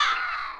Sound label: CR CAW birds; Giants